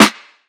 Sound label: Classic Snare - A; Cymatics; PHARAOH Beta Pack; Pharaoh Premium Drum Samples (BETA)